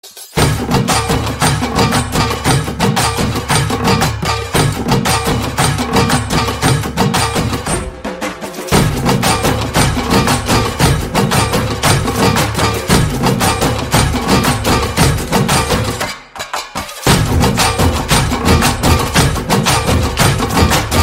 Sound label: Telugu Movie Instrumental